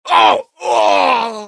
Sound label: male pain death; firefight